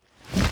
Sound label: Mushroom Roller Roll Up